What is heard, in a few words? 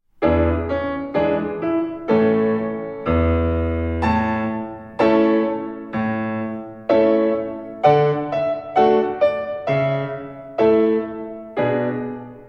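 Thunderstorm Slow Practice, violin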